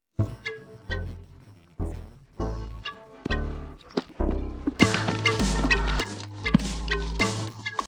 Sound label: no vocals